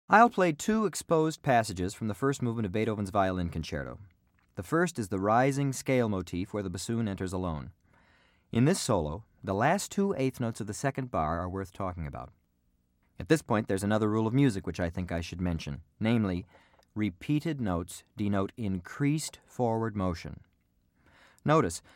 Violin Concerto, Orchestral Excerpts For Bassoon

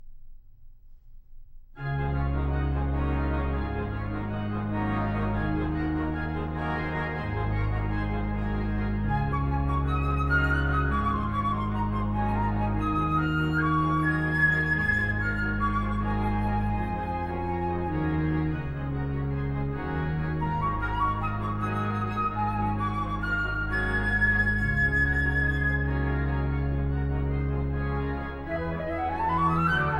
Four Psalms For Flute & Organ

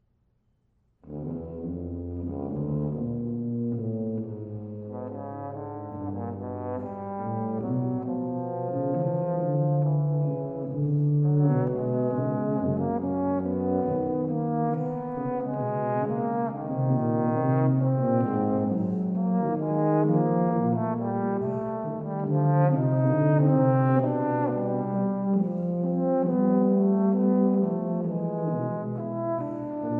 Suite for Tuba and Horn - Sicilian Canon

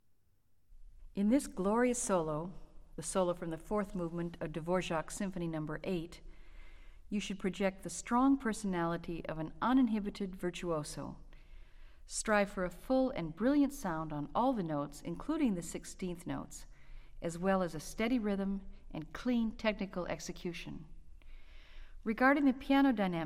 Symphony, flute; Orchestral Excerpts For Flute

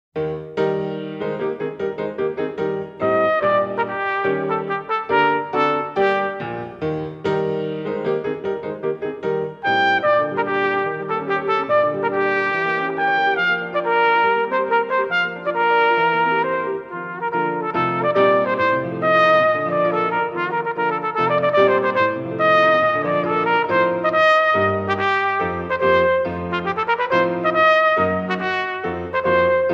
Sonata For Cornet & Piano, Allegro con brio

